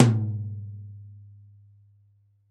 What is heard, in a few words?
TOM2V1-R, Drums Acoustic; AKAI; 90s sample CD; USB Soundscan vol.10; RAW KIT 6